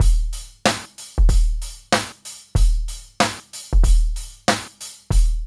DRUMS, Funky Ass Loops; East West

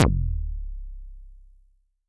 K Juicy, Vermona Mono15 Drums Kicks

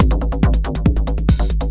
Tom Pattern, drums

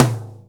DRUMKIT T; Keith Emerson Signature Series

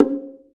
Bongo, Roland BK-5; Percussion

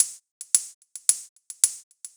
NRG Cymbal; Sonic Foundry (Sony Creative Software); Trance NRG VOL-1

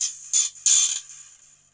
percussion loops, 138, Techno

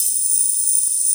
SYN HP C4; Analogon

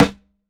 SNARE CENTER; JUNGLE BEATS; ELECTRO KIT

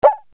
RX7 CUICA, Yamaha RX-7

